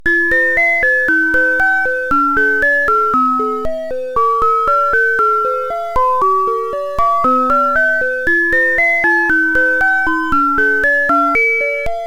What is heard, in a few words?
believe sine tri